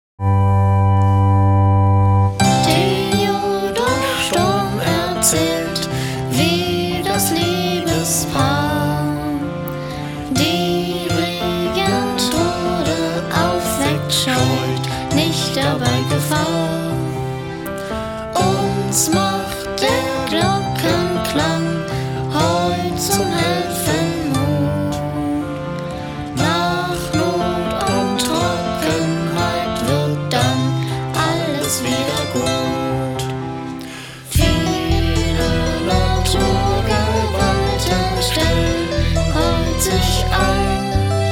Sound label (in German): Hymne Stimmen